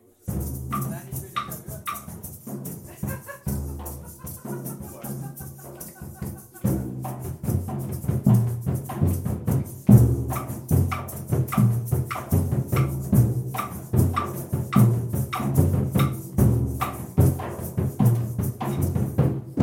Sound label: clave